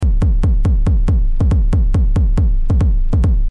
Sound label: drum loop